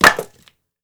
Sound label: med wood land concrete; 3 Leaf Productions - Audio Pack; rock-wood sfx(mini pack)